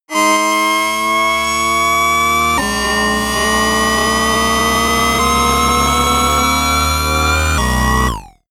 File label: racing car synth